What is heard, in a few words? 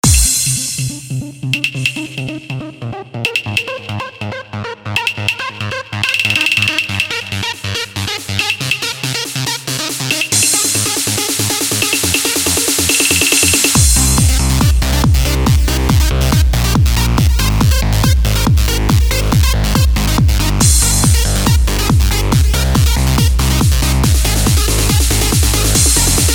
trance